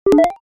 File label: btn negative